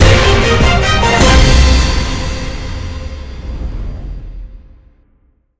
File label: intro Free Spin; netent; fortunerangers